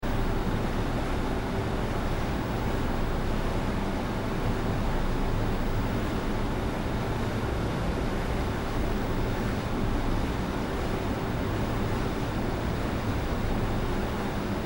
Room Tone Computer Room Server Room Machine Room Multiple Computer Fans; Can also be general light factory ambience or const